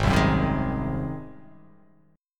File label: Ab 7th Ab down; Ab7 strummed; JGuitar